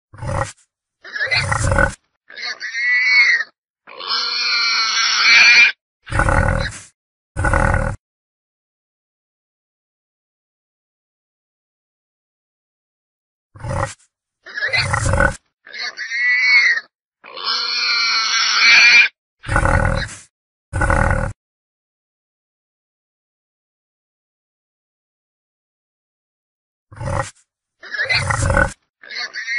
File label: efeito sonoro de javali Sound effect of wild boar